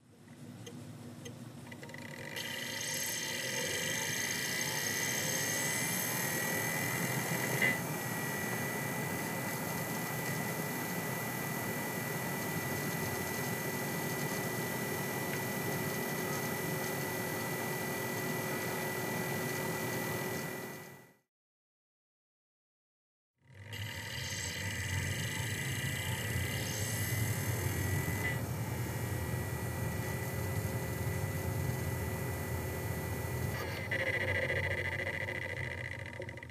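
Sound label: Player Video DVD Reading; dvd-player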